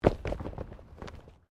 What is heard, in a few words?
Body movement Impact Roll Gravel; Body movement foley to simulate falls and deaths; Rolling; Objects; SoundEffects-Foundation1